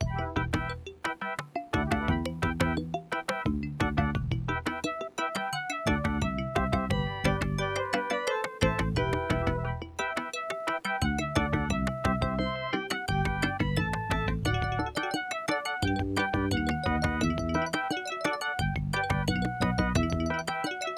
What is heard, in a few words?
Aztec 2316A; Monkey Island Intro